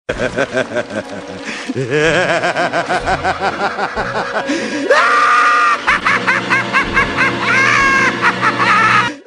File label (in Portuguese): Risada Do Coringa, toque de celular